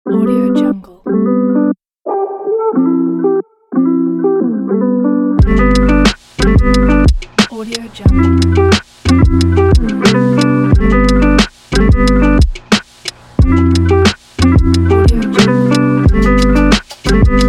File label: vlog beat